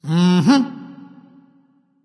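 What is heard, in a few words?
nonverbal agree; smite; voicepack; ullr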